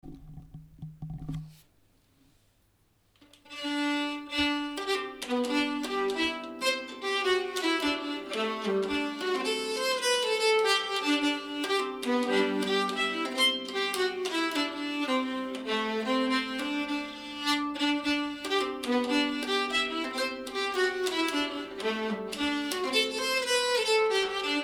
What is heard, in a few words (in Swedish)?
Tierpspolska nstämma